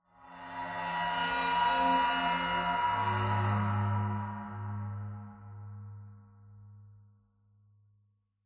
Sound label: cymbal high, ambient; cymbals